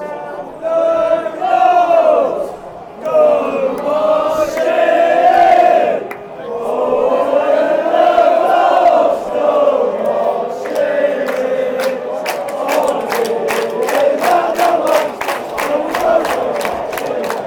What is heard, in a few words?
oh when the gulls go marching in, fan chants